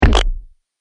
Mud Splat; Slimy Sound Effects; sound design sound effects

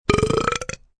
Burp Deep Belch, Burp, deep belch (human voice); Burping; VoiceBox

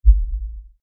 LFE Hit, Lfe Hit Low Bass Impact; Blastwave

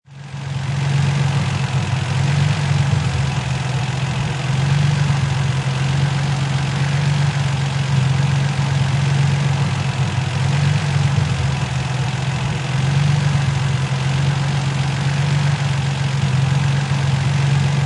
Nissan 350Z Engine Idle; Auto, Power Car, High Performance Car Sound; Engine perspective; motor idling, ticking o; Lynne Music

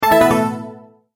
Multimedia Tone-Return/Back

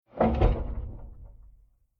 Deep Hollow Wood Hit Impact; Sound Design

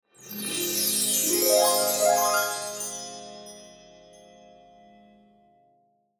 Cymbal Harp, Cymbal Harp Wind Chimes Swell; Cymbals; Musical; Blastwave

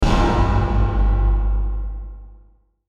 Hit Blast Impact Metallic Reverb Short; Production Element Imaging Element Accent Transition; Blastwave

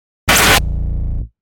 Logo Static Click To Synth Drop; Sonic Logo; Blastwave

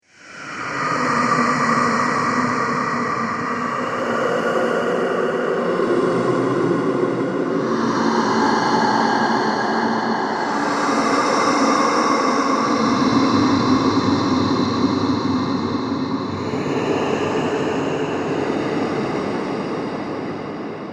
Sinister Breaths Horror, Scary Breathing

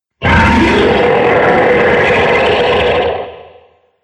Demon Of Distortion, Monster Sound Effects

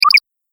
Pickup App Game; Video Game Sound Effects

